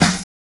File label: Snare